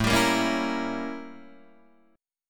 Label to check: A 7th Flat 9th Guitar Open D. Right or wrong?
Right